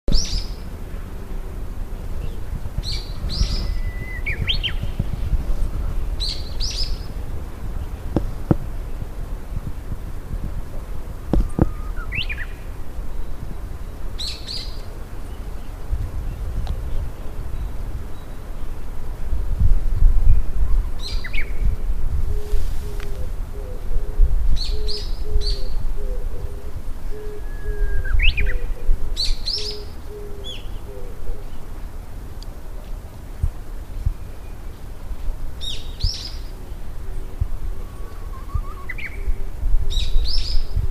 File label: uguisu, birds